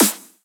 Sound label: normal hit normal